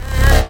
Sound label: Basses Root C